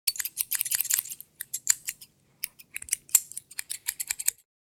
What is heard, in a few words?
scissors